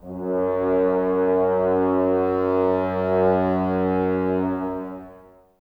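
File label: Brass French Horns, Woodwind Brass Ensemble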